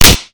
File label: sin shout